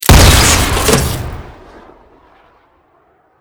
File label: mortar shoot crit; weapons